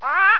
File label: PARROT; 鸟; animal